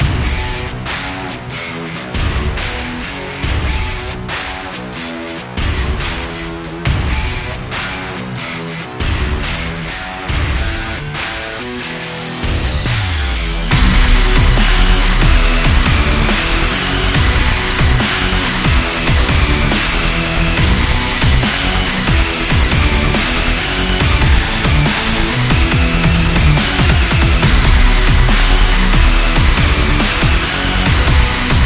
Industrial Dubstep Rock

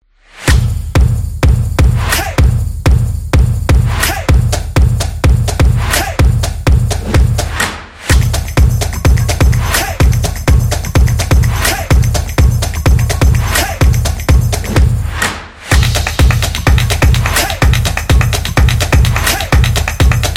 Drum Beat Action; drums trailer

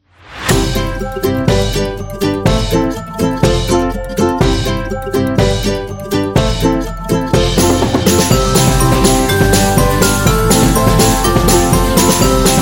Silly; cooking show

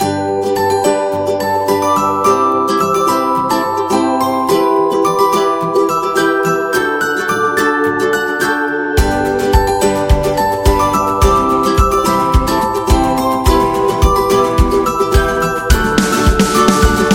Happy and Upbeat; cooking show

